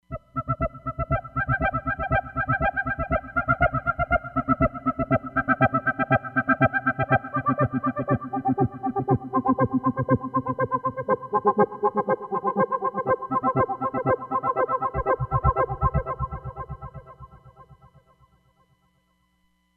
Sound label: Cosmic Voices, Roland Fantom XR